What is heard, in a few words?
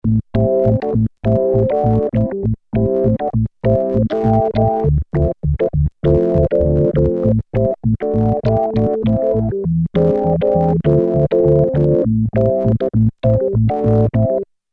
organ, Roland alpha juno synth